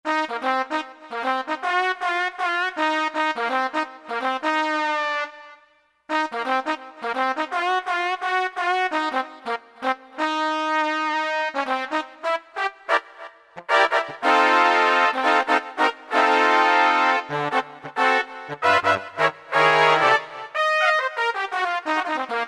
Sax Tpt Tbn, Roland JD-990; Factory Patches

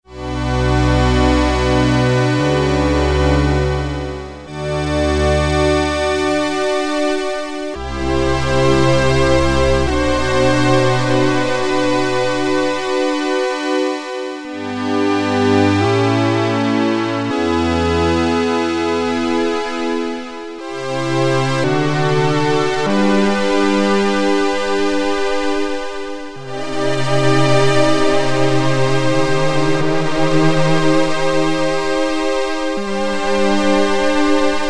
Slow Strings, Prophet-5; Sequential Circuits Prophet-5 (Ver.3); Factory Patch; File 3 Programs

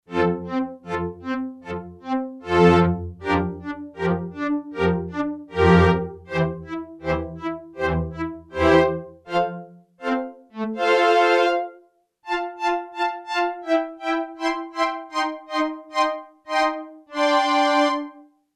Orchestral Violins, Yamaha TX802; Performances; Factory Patches

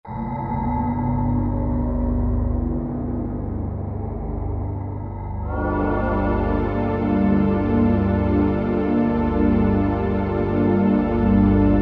Vox Concrete, Korg Wavestation A-D

